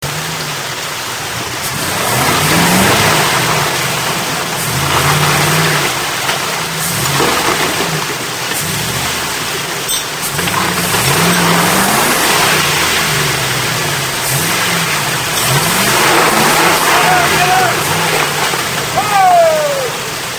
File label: waterfall